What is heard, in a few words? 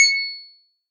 Triangle